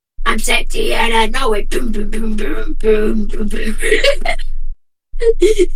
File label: singing